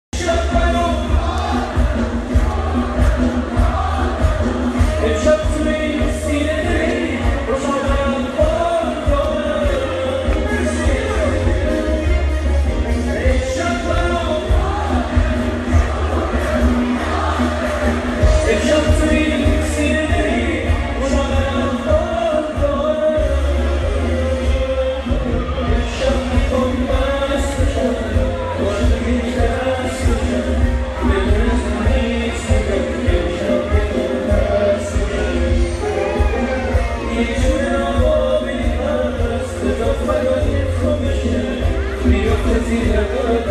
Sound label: party sound